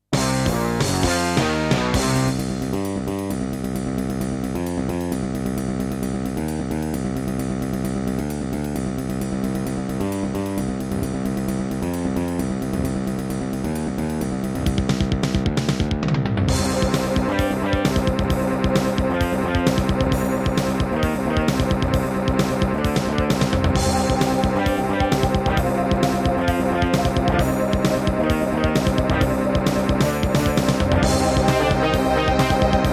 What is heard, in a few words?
Diamond Monster Sound 0.5MB; sigil doom episode 5